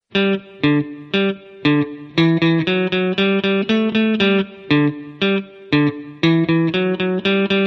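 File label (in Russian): тик так тик так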